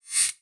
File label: skill metal claw buff